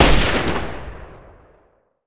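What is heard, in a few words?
Weapon rifle fire; lincolns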